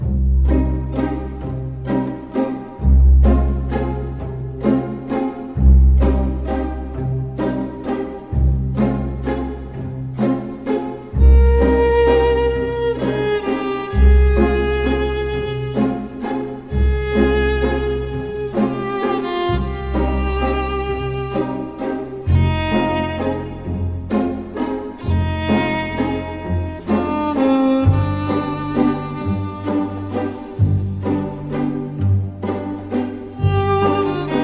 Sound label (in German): Musik